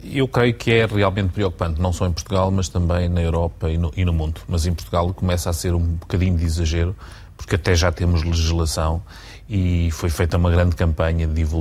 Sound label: Drone